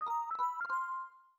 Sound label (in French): Erreur